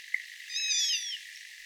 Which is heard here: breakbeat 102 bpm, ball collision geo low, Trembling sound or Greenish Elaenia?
Trembling sound